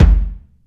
reverb kick drum single shot g key, Royality free kick tuned to the G note